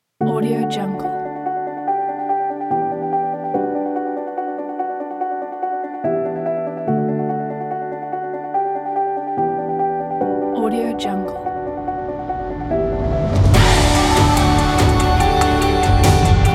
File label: upbeat uplifting corporate; magpiemusic